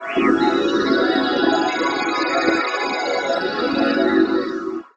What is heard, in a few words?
sonification aquatic chord